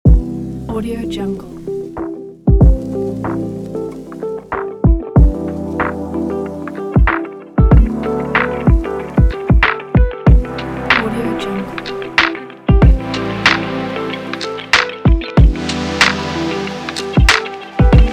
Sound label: atmospheric hiphop